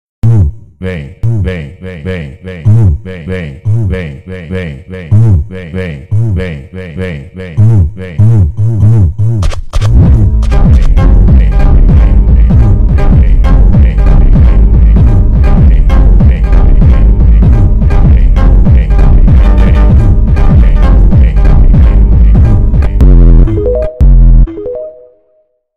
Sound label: funk superbass reverb slow